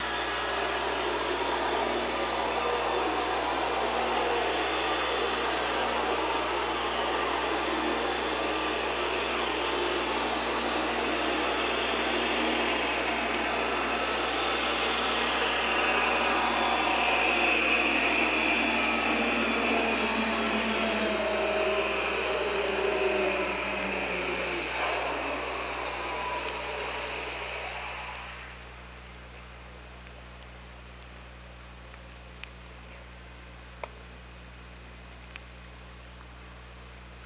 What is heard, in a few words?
OrcaLab